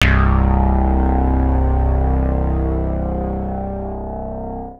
Anasound Long lo C, Industrial Analog Single Notes; Sonic Foundry (Sony Creative Software)